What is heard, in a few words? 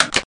click